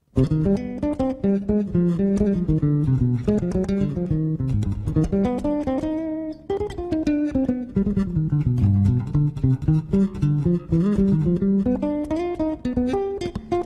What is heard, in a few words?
bass solo salsa; Сальса; Сальса под капотом, рабочая тетрадь